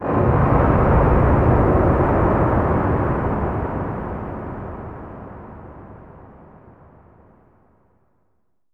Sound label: Downsweeps